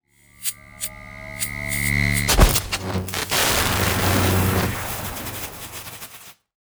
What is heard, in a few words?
electricity lightning blast